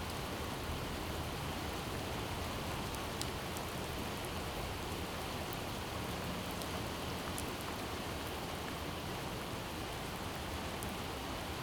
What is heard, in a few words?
ambient Outside Heavy Rain; Project Zomboid